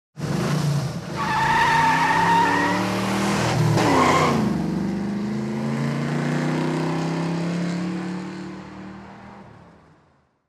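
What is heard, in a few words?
sharp car start tire squeal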